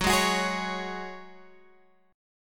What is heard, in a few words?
Gb Suspended 2nd, Guitar Open G